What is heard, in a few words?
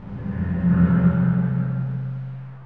hole hit, dungeon; soundscapes